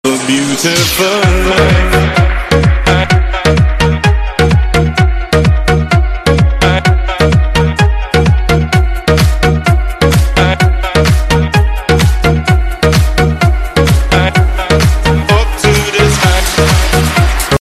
house music electro ringtone

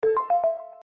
alert ringtone

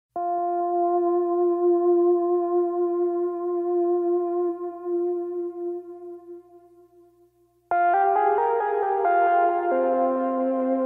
piano ringtone

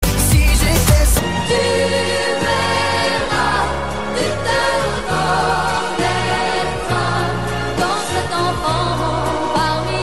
french ringtone

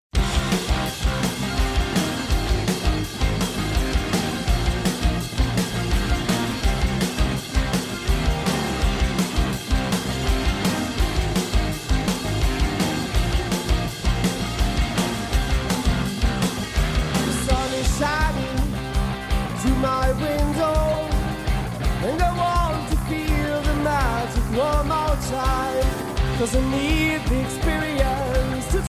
norwegian ring

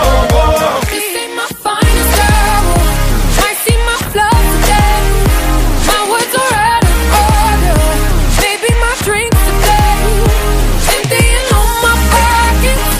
electro ringtone

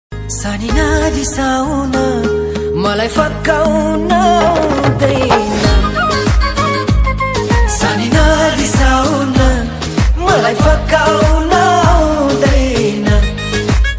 nepali ringtone

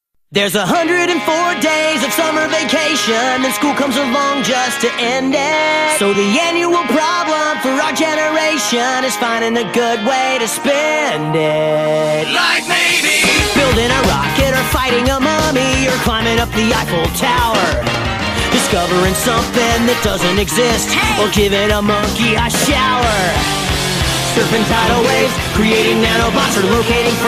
cartoon ringtone; phineas

